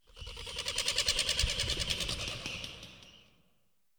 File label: Ambience creature; Adams Venture Origins